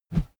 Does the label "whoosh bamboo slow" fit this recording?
Yes